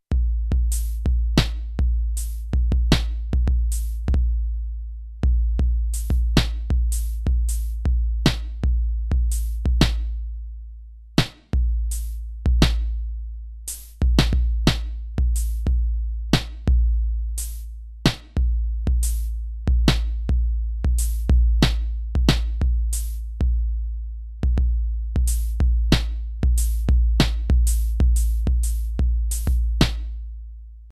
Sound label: Beat